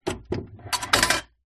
household popcorn maker machine metal kettle flip turn to empty